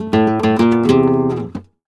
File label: Remates De Pulgar, WAV Guitar, Flamenco